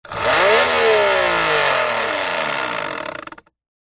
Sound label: industrial pump electric handheld on off short burst close to motor powerful rev